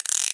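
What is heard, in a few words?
cuffs, police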